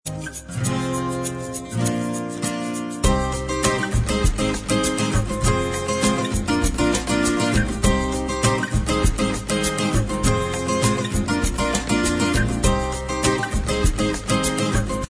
bossa Inst

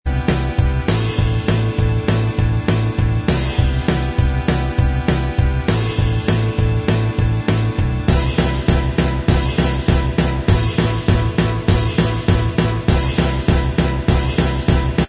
Inst Track

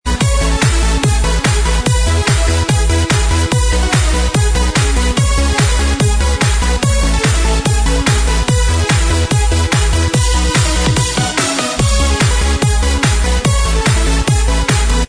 Inst Track

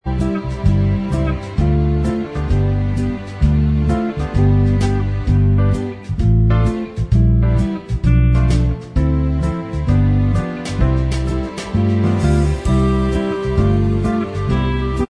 Inst Track

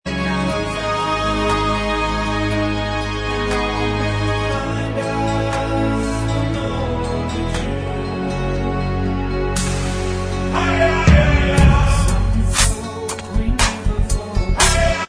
Bvox Track, backing tracks

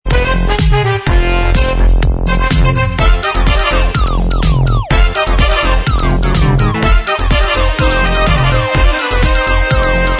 Inst Track

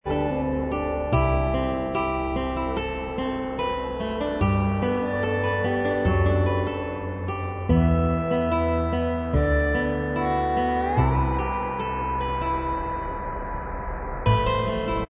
Inst

